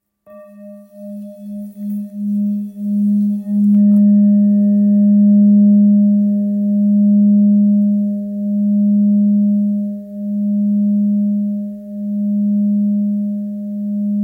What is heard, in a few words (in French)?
Bol thick sol diese